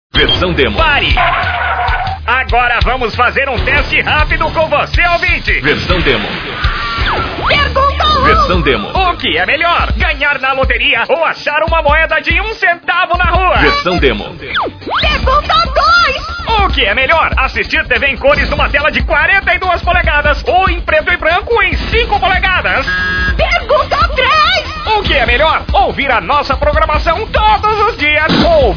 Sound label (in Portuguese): vinhetao happy